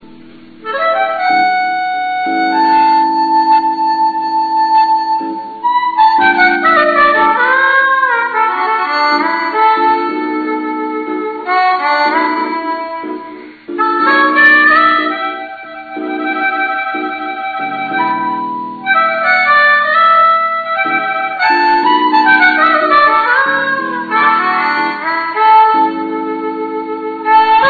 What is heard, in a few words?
Blues